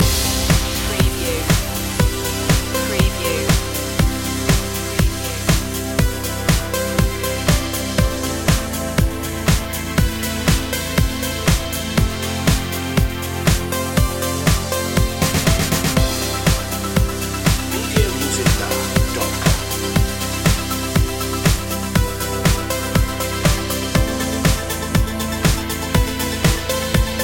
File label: gliding waves loop